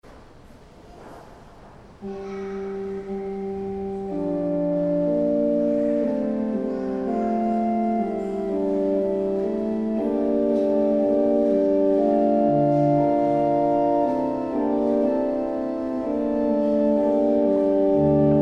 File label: Organ; St. John's Cathedral, Jacksonville Florida